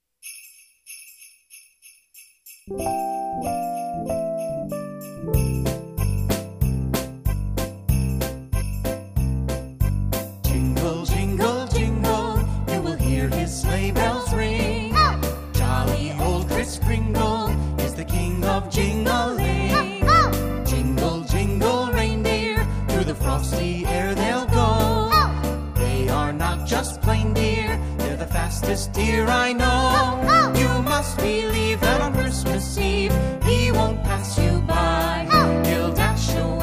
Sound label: jingle jingle jingle